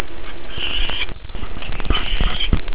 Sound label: hiss, ferret